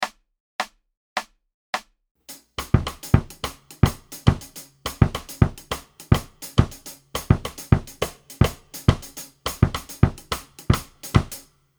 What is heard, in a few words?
Mambo; Daniel Glass